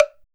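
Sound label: TEMPLE WOOD BLOCK; Drums In Your FACE!; POP-ROCK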